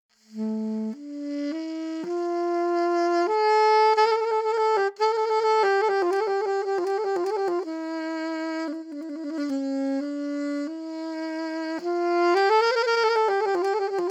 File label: intro Sax